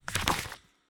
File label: card 10 cards release